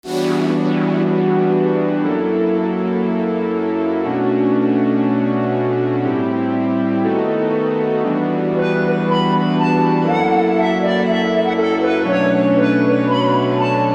loop engine

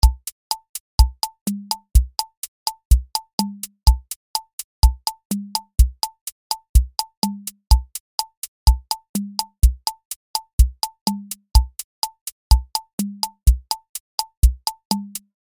samba

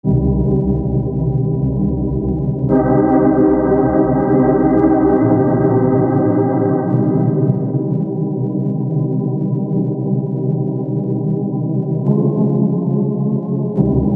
wurlitzer dreams; cirrus

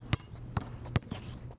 dribble basketball flanger; sporting goods; nova sound hoops; sporting goods master collection

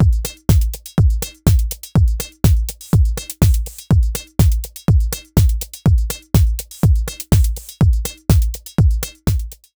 House Slamming; Tremor

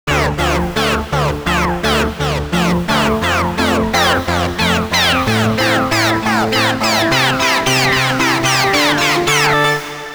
alien ufo seq; the digital life

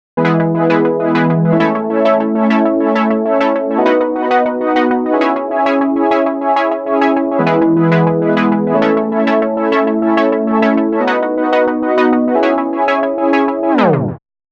serial lpfs medium; e-autofilter300

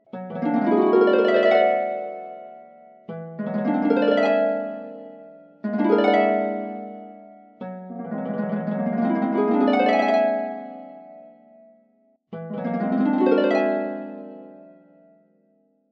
whole tone; twinkle into dreams; sampleson

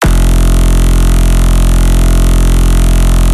dubstep bass dry; wavefield

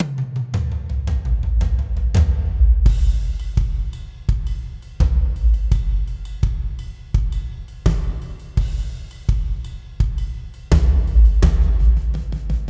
gospel drum only default; gospel drum kit ii; polarity audio

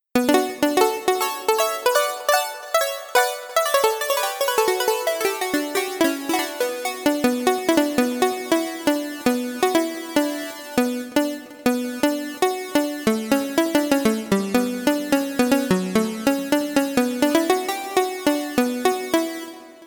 light pluck; the transmitter soundset

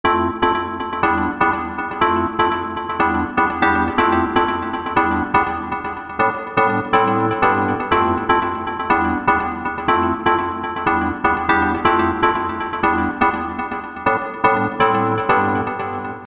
pluck

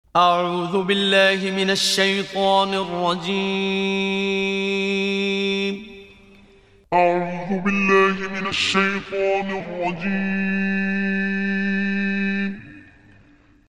formants

